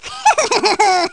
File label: baby laugh; baby bowser